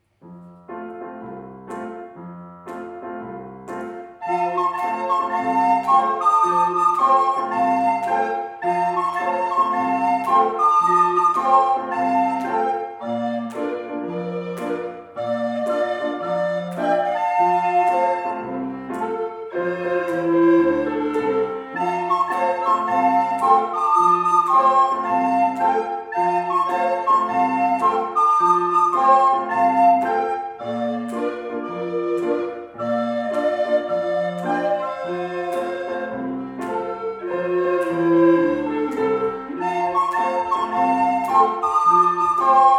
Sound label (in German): Flötenpiepen